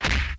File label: Punch; RedDot